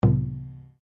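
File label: Drum Taiko piano, Japanese Taiko Drum; Miscellaneous Musical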